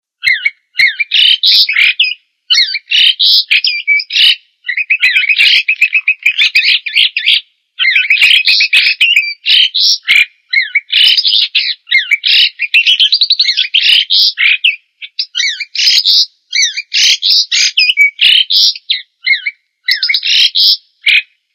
jalaksuren, agroburung

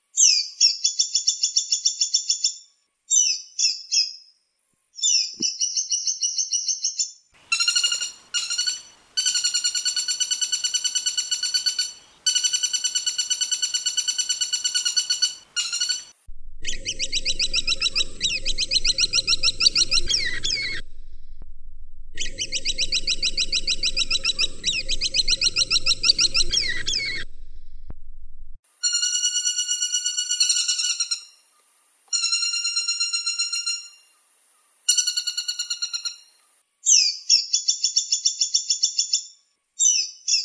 kombinasi tiga pelatuk

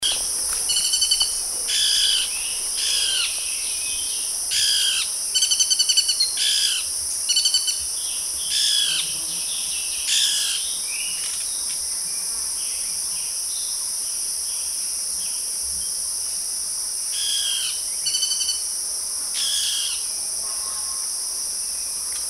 suara burung cucak cungkok orange bellied leafbird

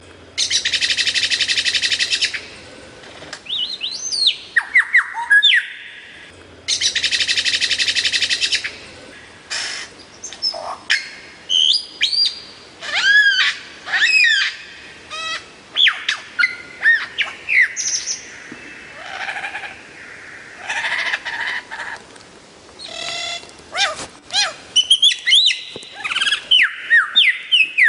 suara lyrebird

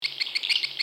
varian lagu suara burung walet

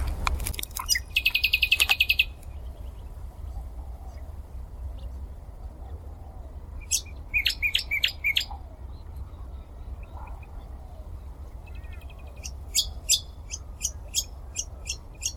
kecial kombo

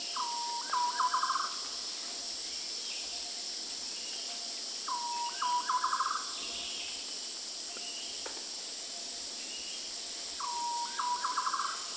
suara burung madi hijau kecil

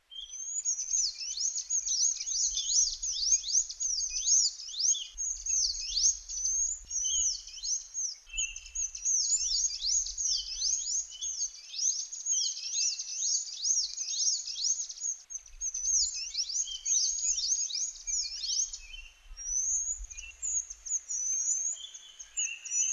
suara sepah kecil

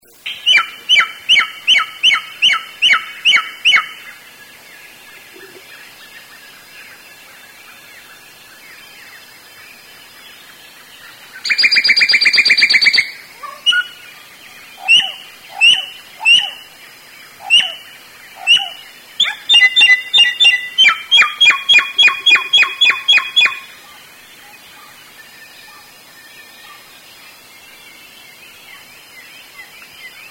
suara burung samyong

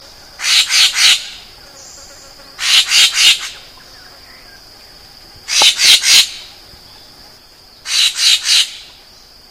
tangkar cetrong varias

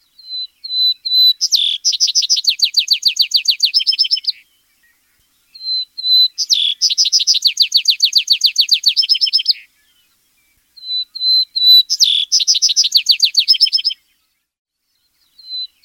suara vesper sparrow

